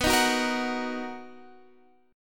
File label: D sharp Minor B, Guitar